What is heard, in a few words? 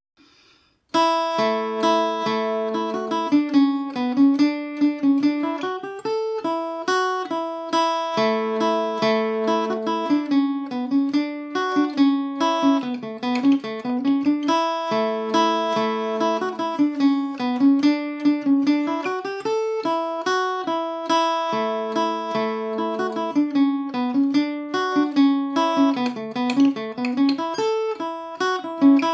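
A mixolydian, Irish mandolin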